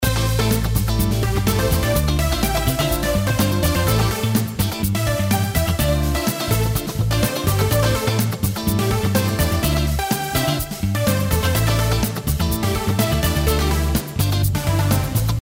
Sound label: bgm deluxe; lucky7 loading